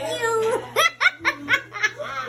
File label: hahaha